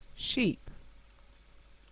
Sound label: sheep